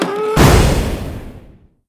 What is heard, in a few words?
Wisp Shoot; Risk of Rain